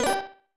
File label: Slot Start; Hisshou 777 Fighter II; SNES